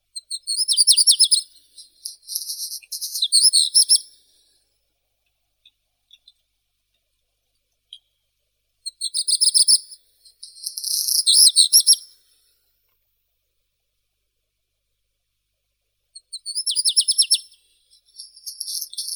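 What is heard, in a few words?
black redstart, phoenicurus ochruros; gorihvostka chernushka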